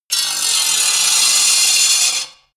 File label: metal scrape hard